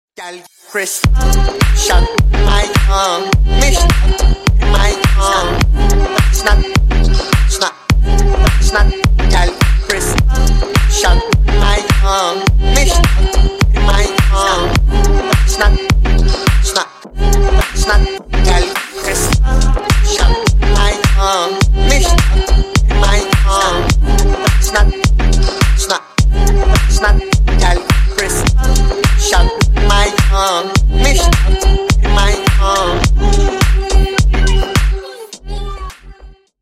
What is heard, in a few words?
bit remix